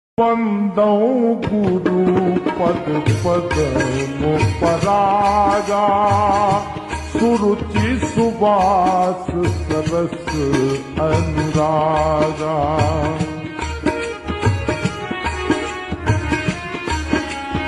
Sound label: Slowed Reverb Ringtone